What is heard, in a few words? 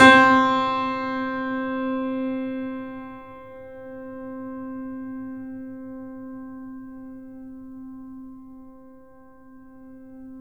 The Piano, sustain